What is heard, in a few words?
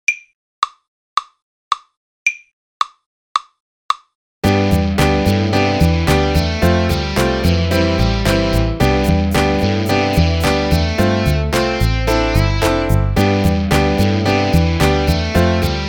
Kit Solo